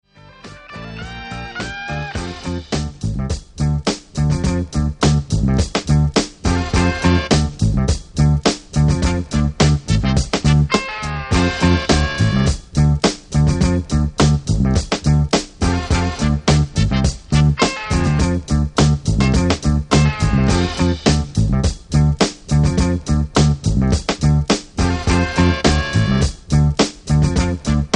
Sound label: Hardcore Instrumental